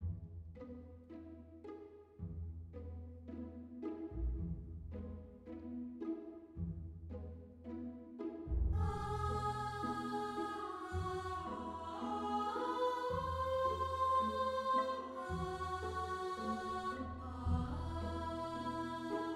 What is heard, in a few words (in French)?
orchestre audio avec voix; orchestra e voci sintetiche